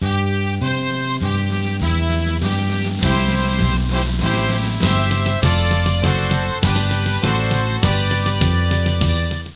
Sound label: Anthem; austrlia